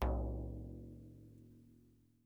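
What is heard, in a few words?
Large Frame Drum, World Percussion One Shots; Sony CS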